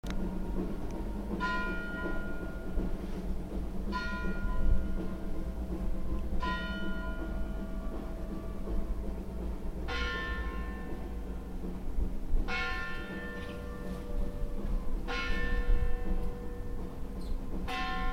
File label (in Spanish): campana reloj; orgaz